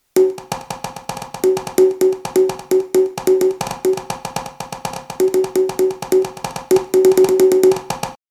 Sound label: Bongos